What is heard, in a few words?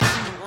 "ohh"